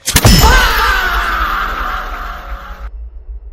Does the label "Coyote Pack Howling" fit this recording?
No